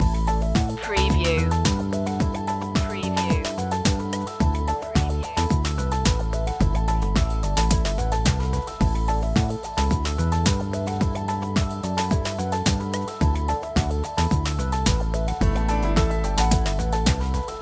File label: chillwave podcast loop